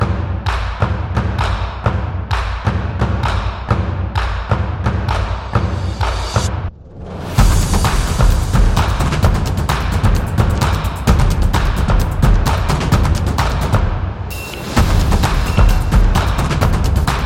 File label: Percussion Sports; football drums